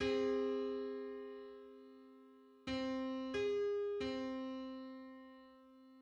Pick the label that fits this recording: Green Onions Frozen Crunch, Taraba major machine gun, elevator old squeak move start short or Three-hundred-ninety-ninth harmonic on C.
Three-hundred-ninety-ninth harmonic on C